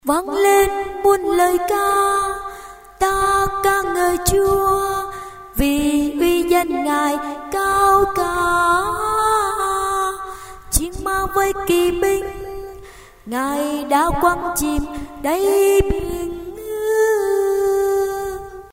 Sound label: sop alto